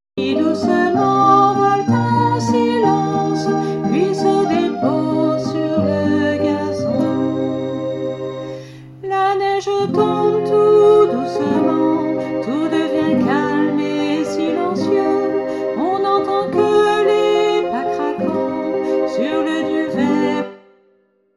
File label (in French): CHANT